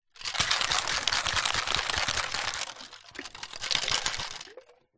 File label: food drink cocktail shaker metal shake ice liquid